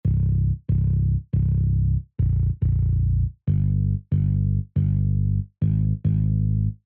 Bass

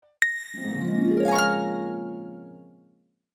orchestration

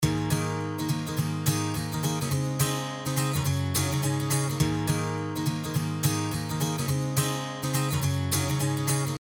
guitar original

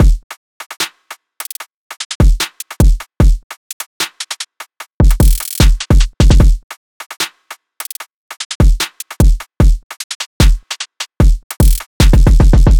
Drill Drums Before

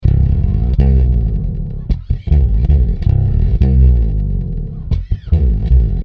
bass slide

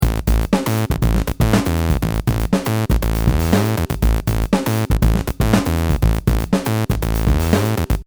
synth bass

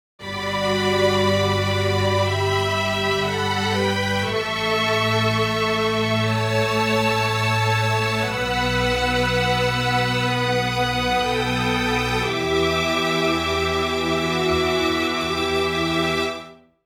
Strings Reverb Off

